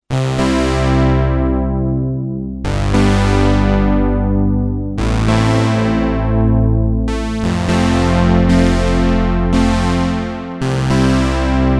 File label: polysynth